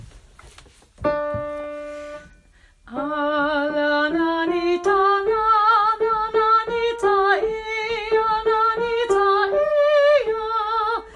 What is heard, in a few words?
Tenor